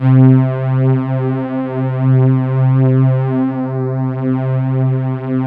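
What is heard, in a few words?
PAD Comp String; Best Service Gigapack 1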